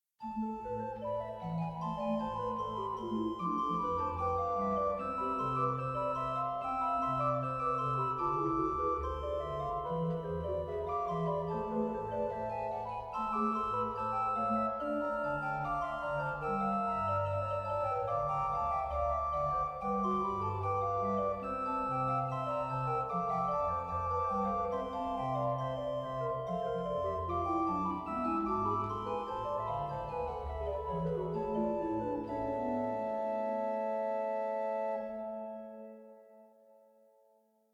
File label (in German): Orgelbuechlein; BWV 644; Ach wie nichtig ach wie fluechtig